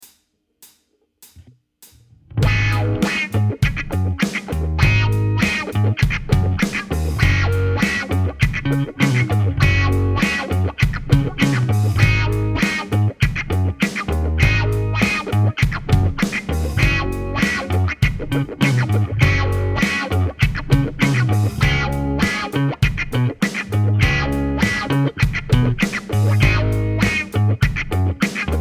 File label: electronic party